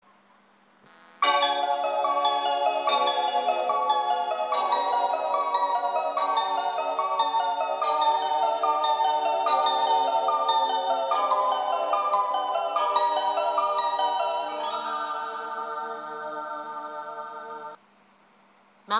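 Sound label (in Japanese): 7番線発車メロディー, 山形駅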